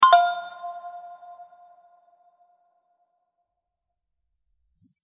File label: notification